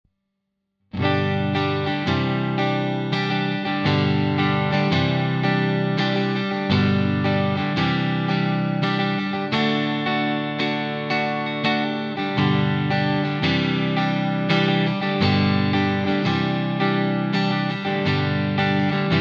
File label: D'Addario XS Electric